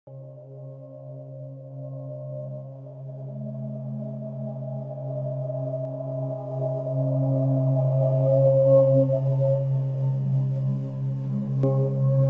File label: 528 Hz; Reducing Tension-Anxiety